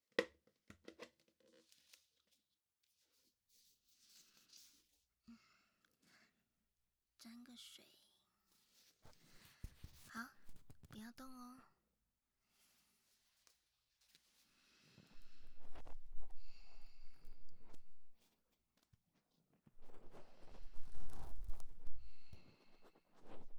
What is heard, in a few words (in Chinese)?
事後的耳朵清潔（濕棉花棒）; 葉月Ver.